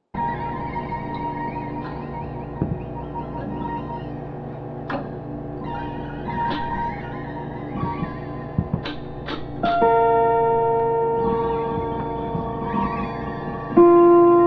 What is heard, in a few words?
Sworls, harp